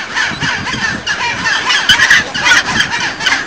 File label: chinstrap penguins; Antarctica